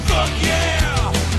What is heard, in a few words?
fire, weapons